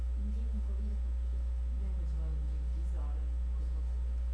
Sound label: door